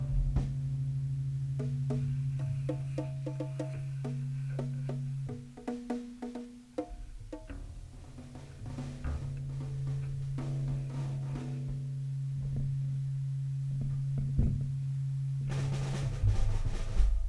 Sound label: Windy Feedback; Quarantine